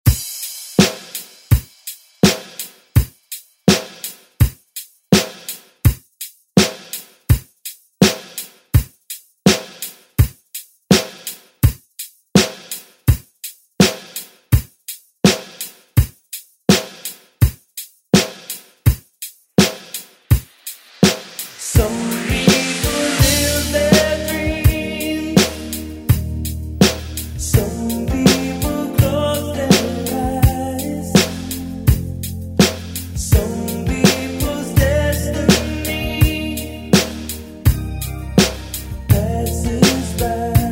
80s ReDrum; DJ Mhark

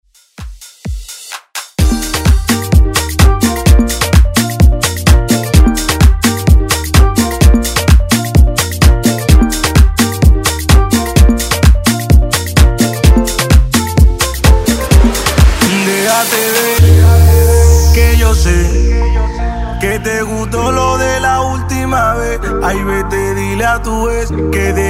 Latin House; Redrum

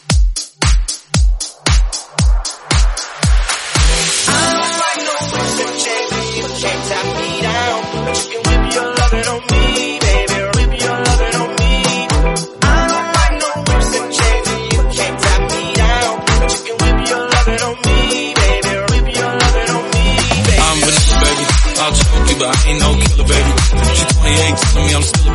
DISCO 115 bpm Em; remixplanet

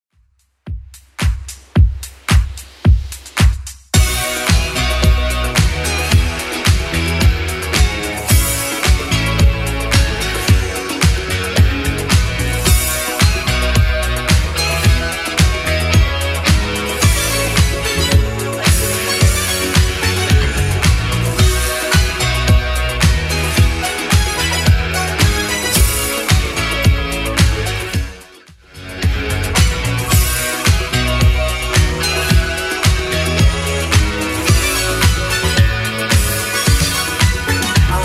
80s Club 110 bpm CLEAN

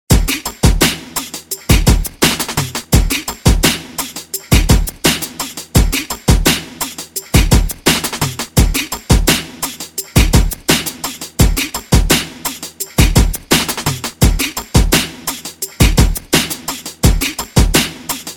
Hip Hop; Dj Intro Outro; Redrum

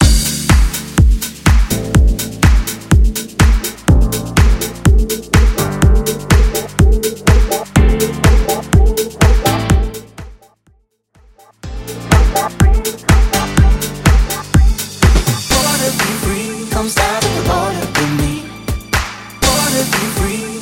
Transition Re Drum 124 99 Bpm; 2019 Mainstream VIP Transition Tool; Vegas Edit

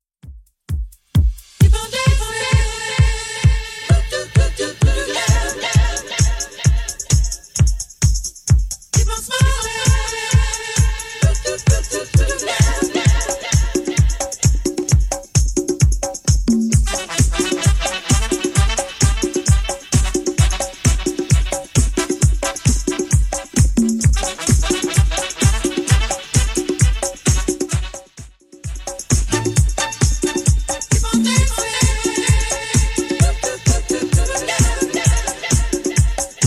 70s Redrum 136bpm

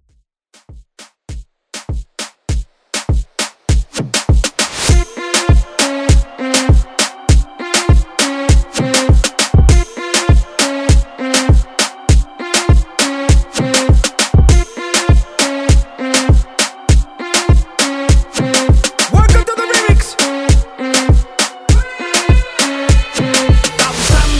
reggaeton redrum

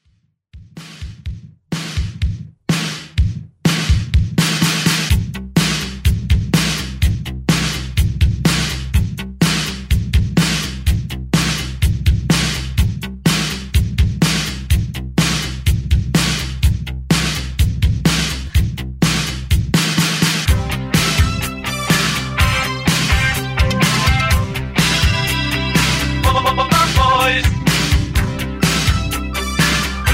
80s Quick Hitter

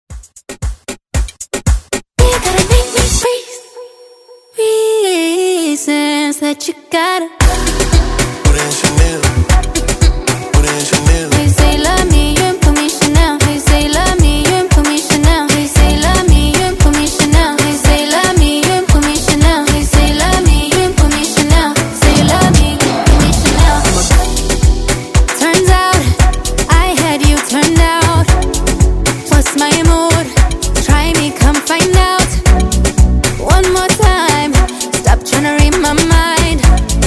Moombahton Redrum

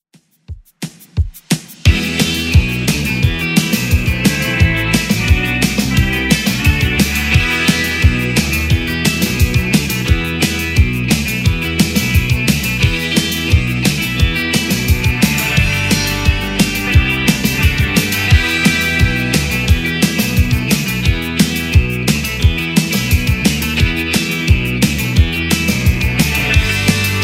80s 175bpm; Redrum

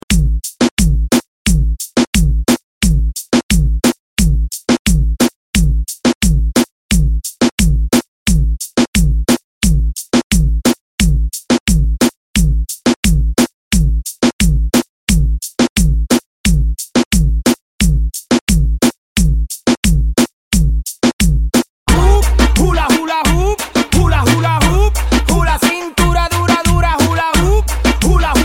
Dj Intro Latino Hook; Redrum

